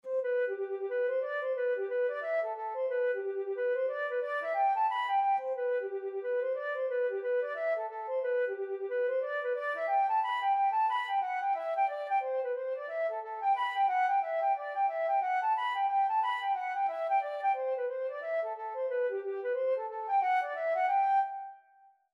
flute

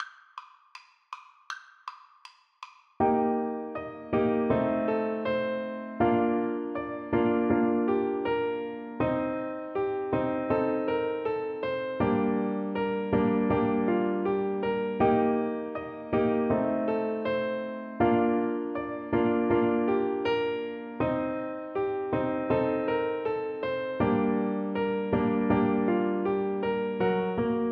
Oboe; borodin polovtsian

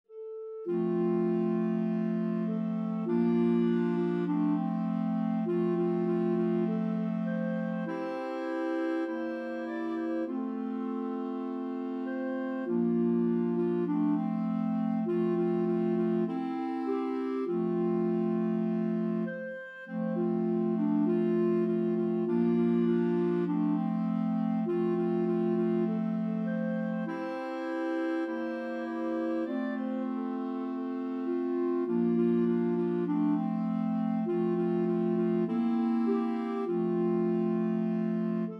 swing low 4 CL

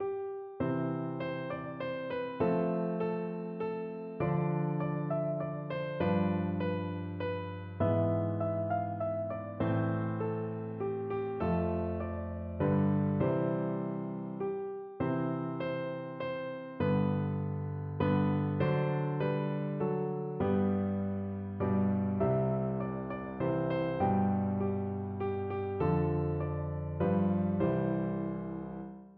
we wish piano duet

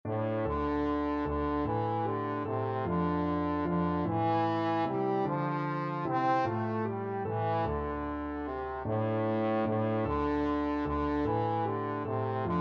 trombone

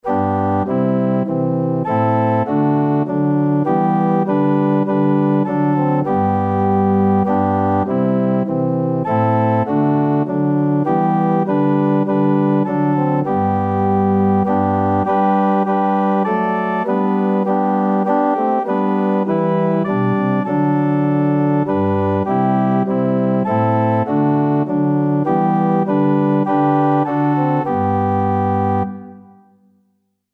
organ

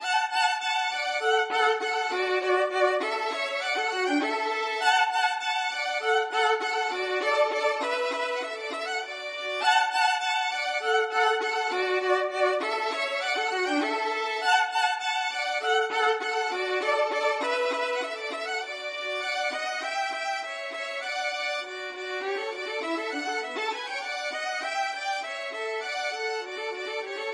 duos allegro violin duet

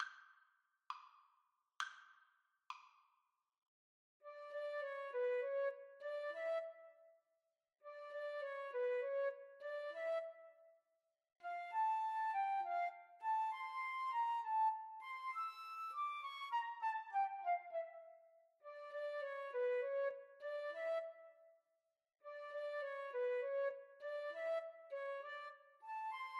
Flute duet

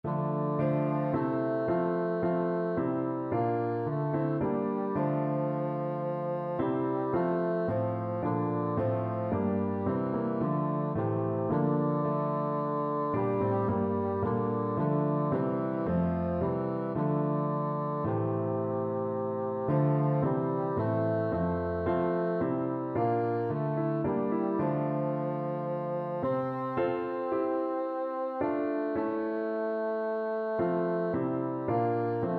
emmanuel, bassoon

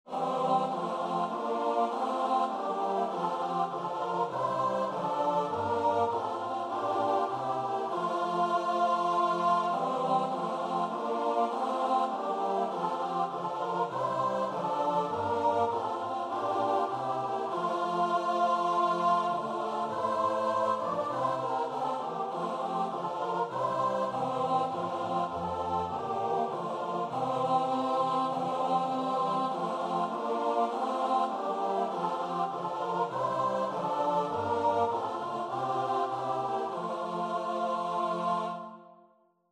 choir

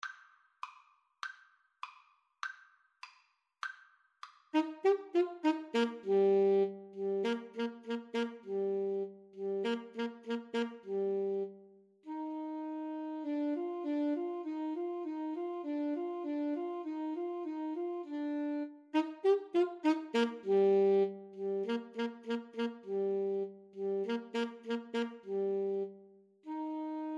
mozart k487, Clarinet-Saxophone Duet; Mozart, Wolfgang Amadeus - Twelve Duets k.487 no.1

